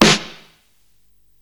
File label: Snare, Psychedelic Drums